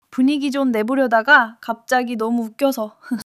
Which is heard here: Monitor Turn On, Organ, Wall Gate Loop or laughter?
laughter